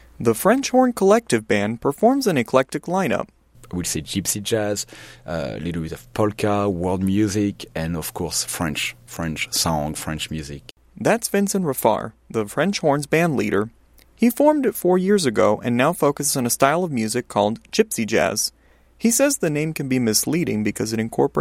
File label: French Horn